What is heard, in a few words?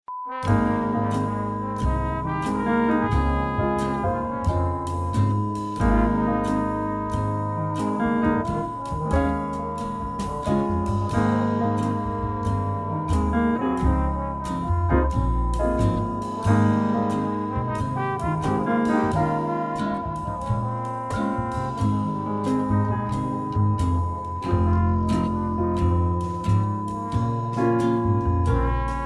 cheerful time